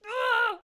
Peasant Attack Vocals

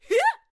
Hay Baler Attack Vocals

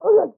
Executioner Attack Vocals

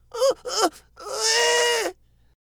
Cactus Attack Vocals

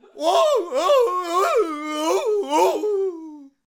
Jouster Attack Vocals

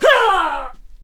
Clubber Death Vocals

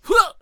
Blunderbuss Attack Vocals

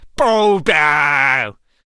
Gunslinger Attack Vocals

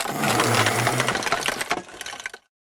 Skeleton Warrior Death Vocals

